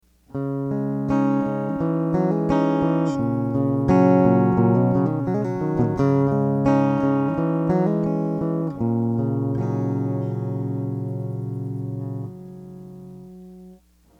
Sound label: rock